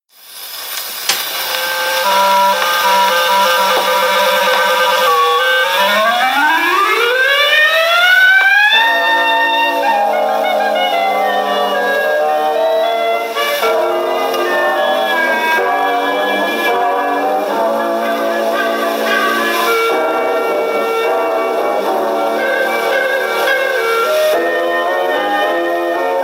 Orchestra; 1938

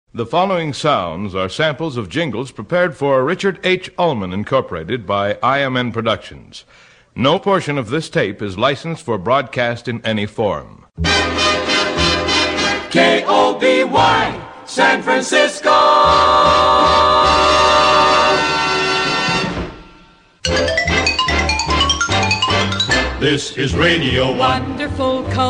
Jingle; KOBY; IMN; W Series

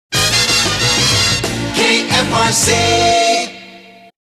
Sung Once Fast; KFRC; You Series

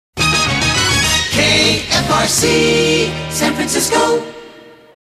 San Francisco Sung Jingle; KFRC; TM Series

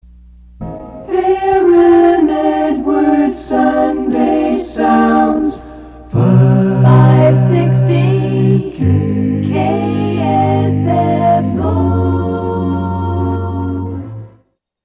Sunday Jingle; KSFO

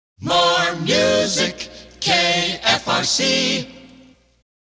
More Music Acap, Jingles; KFRC

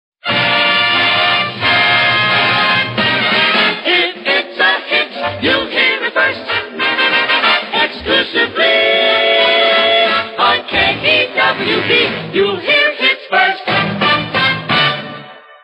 Jingles; KEWB; You Heard It First

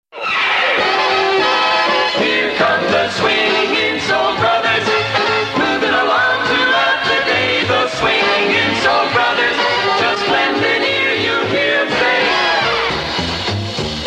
Swingin Soul Bros Jingle; KSOL 1450

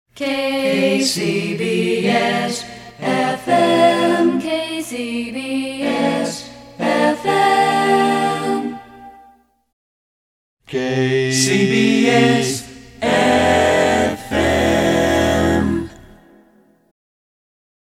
Jingle Auditions; KCBS-FM; PAMS

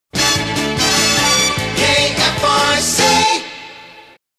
Sung Jingle; KFRC; TM You Series

